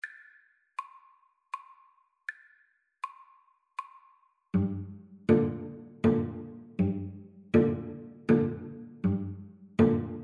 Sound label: trumpet cello, Trumpet-Cello Duet